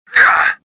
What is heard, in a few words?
choke; Star Wars Jedi Knight II Jedi Outcast; XBOX